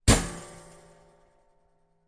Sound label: impact metal basket draining board hit resonate